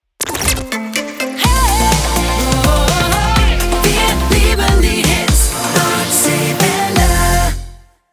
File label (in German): Jingles Beispiel; Nordseewelle